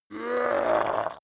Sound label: yeti grunt pain; kreaturen